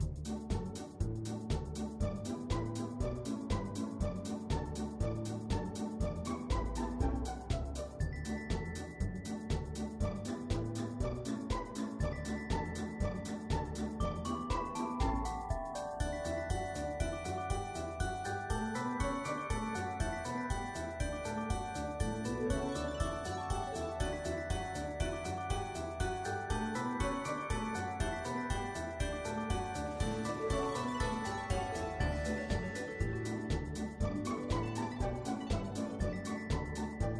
music cheerful; bgm

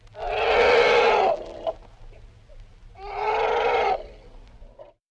elephant calf

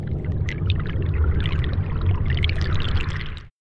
seahorse

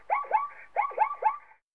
zebra

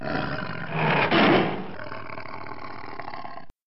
tiger

